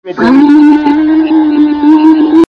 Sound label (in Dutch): immens harde gulp